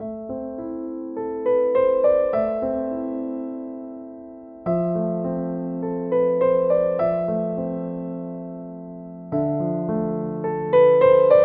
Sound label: Swan Lake, Piano